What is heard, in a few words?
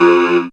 syn, Dance Synths, Trancers; Trancers 2